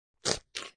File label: Slurp, Slurping sound.; Slurping Sound Effects; Human